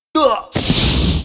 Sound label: mob raid die; Monster; 레이드; 무림대도연주범